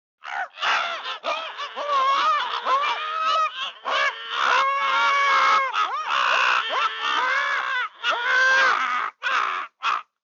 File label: Various animals in farm; The Look Back SFX